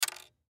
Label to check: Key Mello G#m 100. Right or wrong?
Wrong